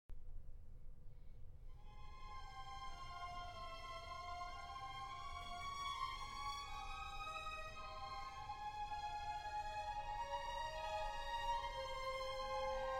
Romance for Violin and Orchestra in F Minor

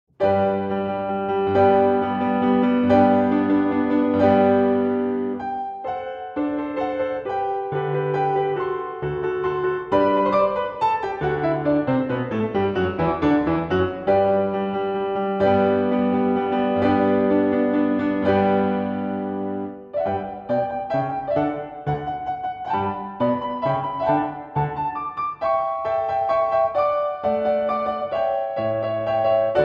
Solo Piano; Alceste; Saint-Saëns; Caprice Sur Le Airs De Ballet

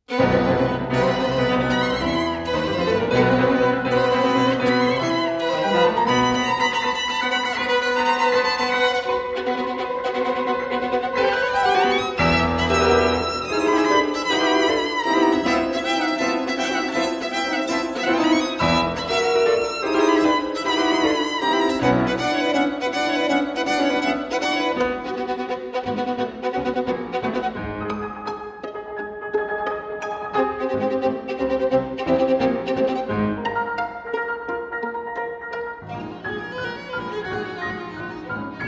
Tarantella

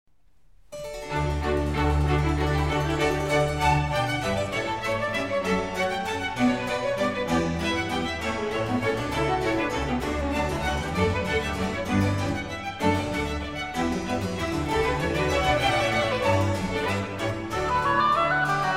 Harpsichord Concerto E major Allegro; BWV 1053

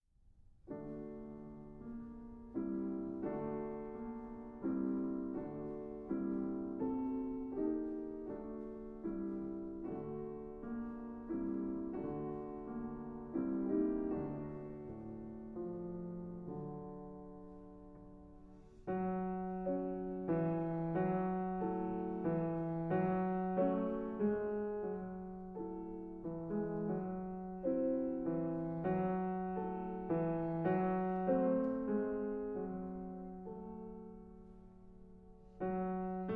Piano Pieces; 24 Piano Pieces

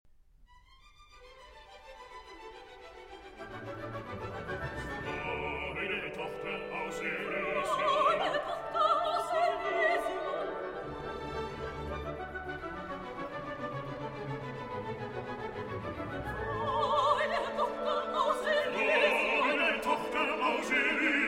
Symphony in D Minor, Choral, Allegro ma Non Tanto

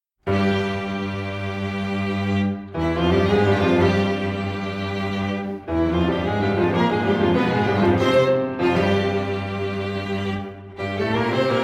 Rhapsody For Piano & Strings

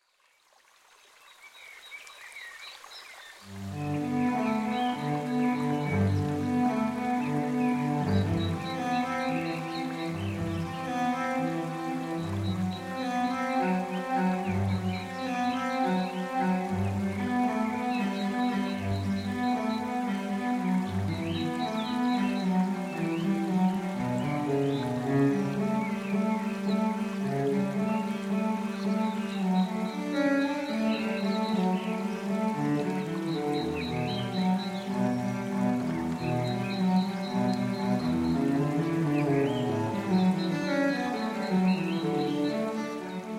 Prelude From Cello Suite No. 1 in G Major, (Bird; Bird Calls

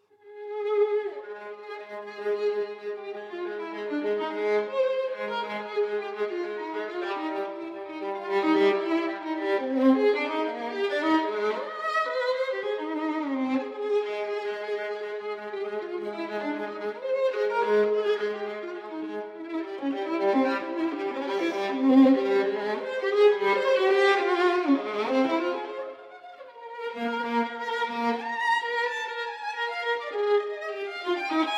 Caprices For Solo Violin; Paganini

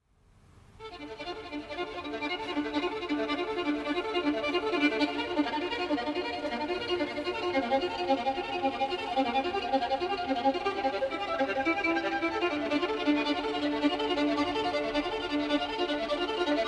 solo violin and string orchestra; divisi

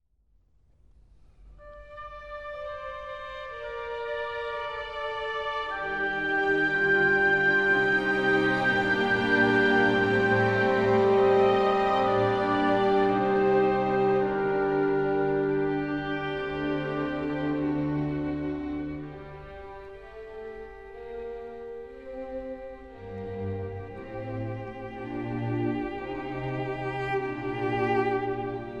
Cello Concerto in B flat; Boccherini

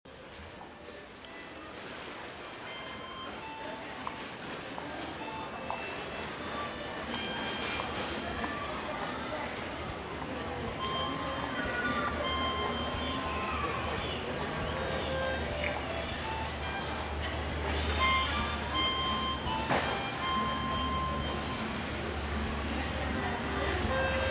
Sound Ambience - At Market; Makishi Kosetsu Market

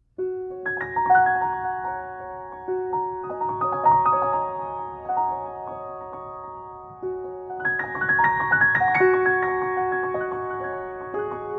Merrily We Roll Along (Instrumental Version)

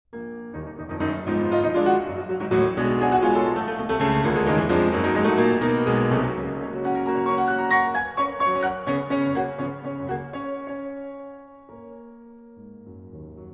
Sonata In B-Flat Minor Funeral March Scherzo

